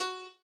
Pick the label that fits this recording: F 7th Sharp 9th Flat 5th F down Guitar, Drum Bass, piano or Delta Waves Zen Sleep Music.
piano